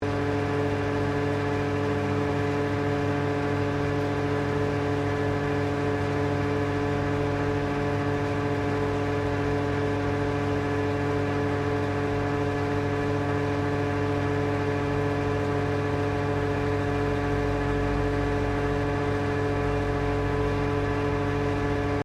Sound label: PETROL PUMP OPERATING LOOP, Fuel or gas pump; Machinery (Sound Effects:Machines)